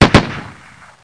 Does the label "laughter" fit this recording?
No